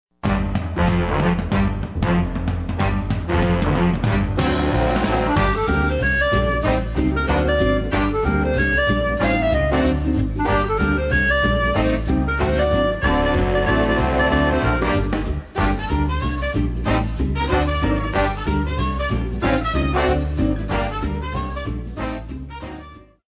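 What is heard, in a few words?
swing big band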